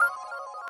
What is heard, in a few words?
synth; osu